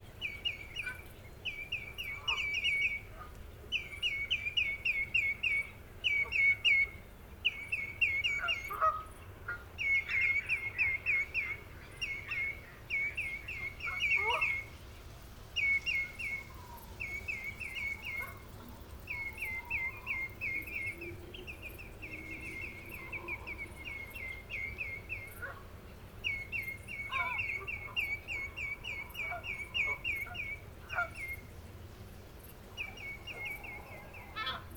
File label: Common Greenshank Calls Of Two Or Three Taking Off For Migration